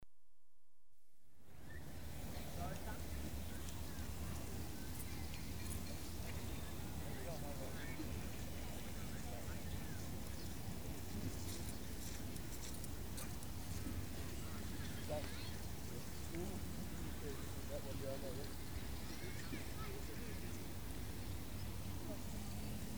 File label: Show Jumping - wide perspective jumping arena, Equestrian Events; BBC Sound Effects Library